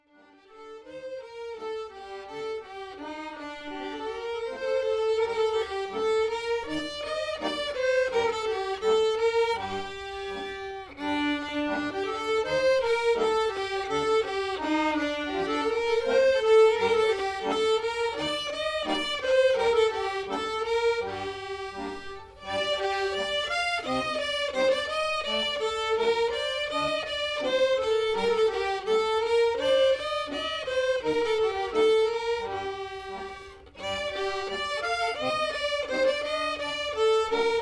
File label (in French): Gavotte caresse